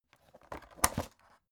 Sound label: folder open hard